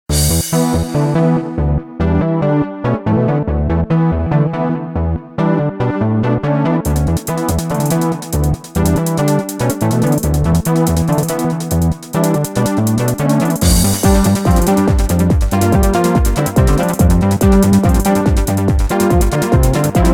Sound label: Pinball, Music; Pure Pinball